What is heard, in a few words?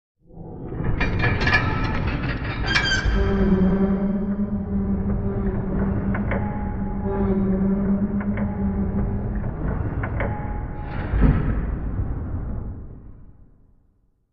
Castle Gate Lowered, drawbridge